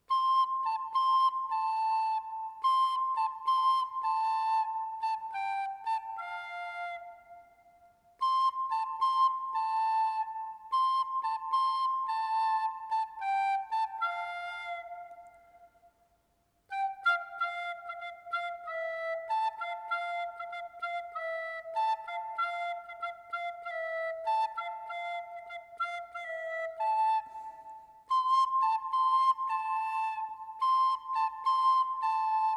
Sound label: flute; Sinkla fåglar; Instr. i Kårsta; kyrkokonserter